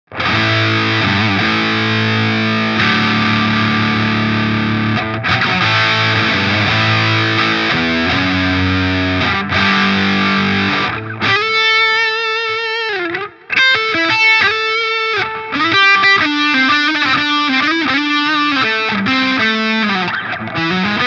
DRV Gain max Steg; 1981 Inventions

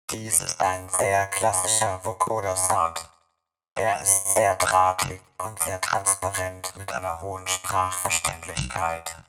Waves Ovox

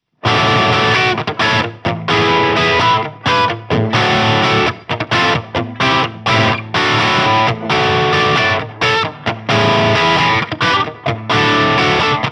RR X Series Crunch

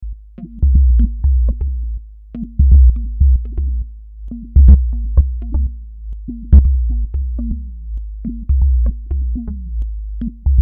MOD EX Drum Machine

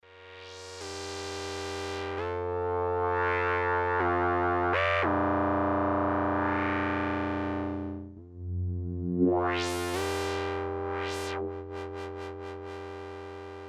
bass synt

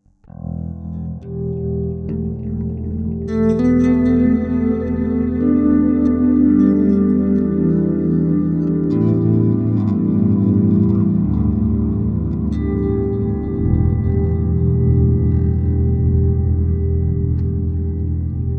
Athmosphere

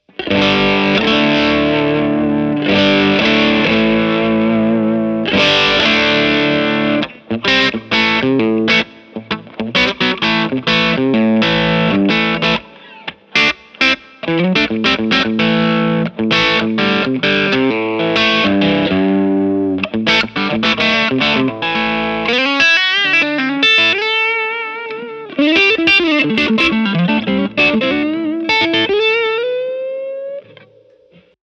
crunch gain 13h el34; thr

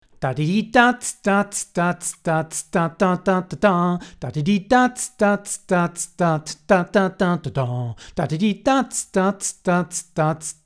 Camden neutral

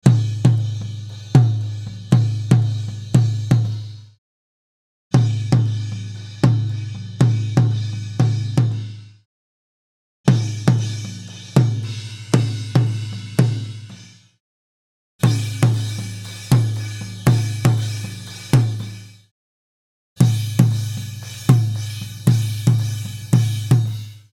toms md421 e604 e904 e908d e908t

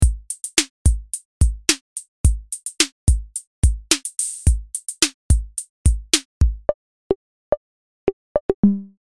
Hex Drum 1978

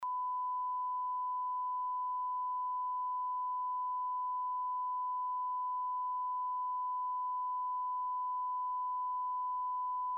sine -12dbfs

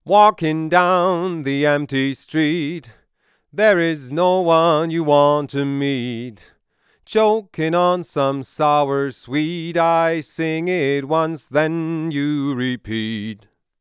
Summit Audio TPA 200B Vocal clean

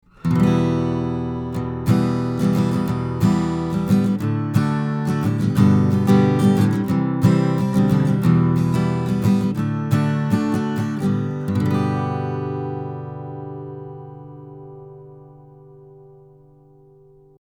Neumann U89 A Guitar 20cm; UFX

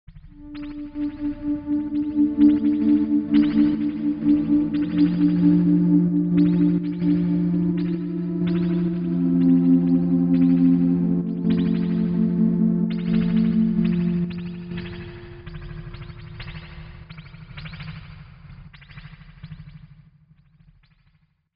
LFO Targets Shake; U-He Twangstroem; Tyrell N6

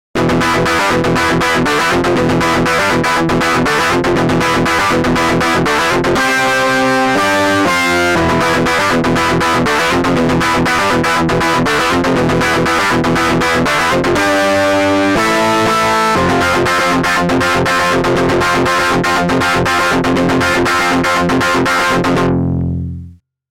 Guitarist

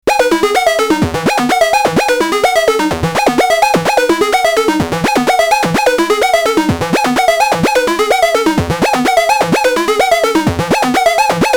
Behringer Model D Modulation via Modwheel

